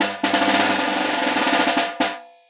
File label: snare